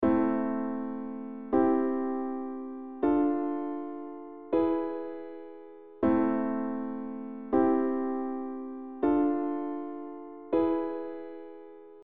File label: I III IV V, Progression Accords; Les bases harmonie